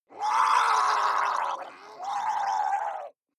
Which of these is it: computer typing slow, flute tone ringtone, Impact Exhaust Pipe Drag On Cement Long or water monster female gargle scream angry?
water monster female gargle scream angry